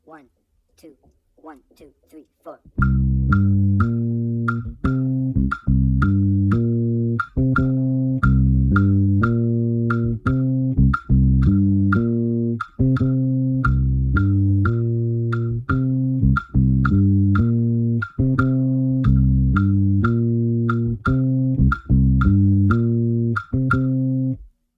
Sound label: bass songo